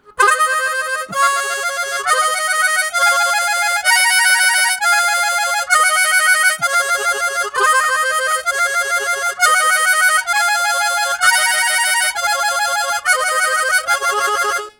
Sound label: Rolling, Harmonica; Zero G - Harmonica